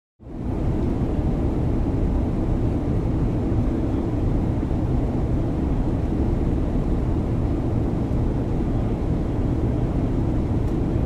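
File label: jet passenger plane in the cabin during flight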